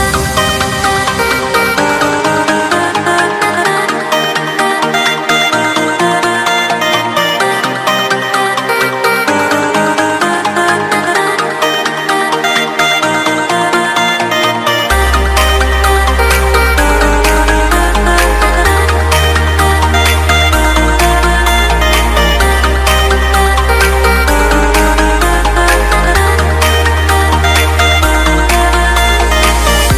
Electro

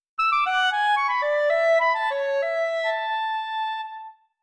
Nokia Origin Remake

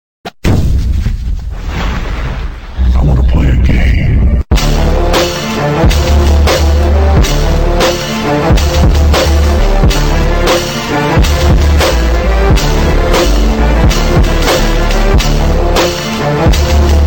Saw Anthem Krump

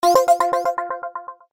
Echoes

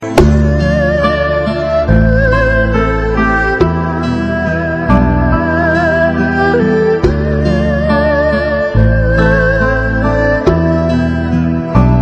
Buddha Music

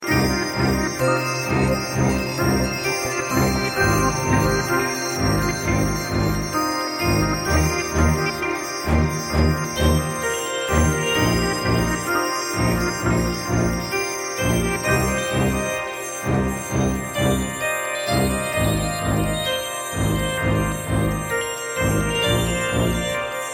Electric; Mgs2

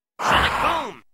Sonic Boom

